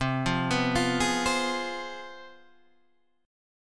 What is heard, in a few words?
C Major 7th Suspended 4th Sharp 5th C down Guitar Half Step Down